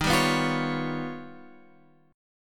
D sharp Augmented 9th D sharp, Guitar

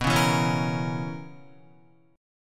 B Major 7th Suspended 2nd Suspended 4th B Guitar; BM7sus2sus4; strummed; JGuitar

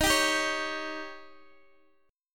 D sharp 7th D sharp, Guitar Open D

